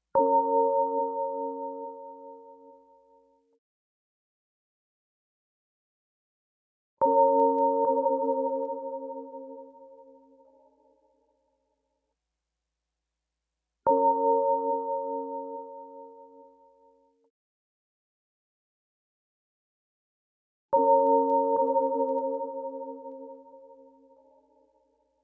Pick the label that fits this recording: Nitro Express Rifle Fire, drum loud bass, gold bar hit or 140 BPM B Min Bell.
140 BPM B Min Bell